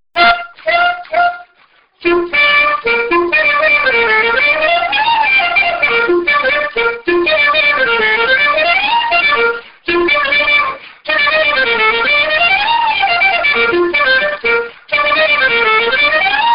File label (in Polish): polka; region przeworski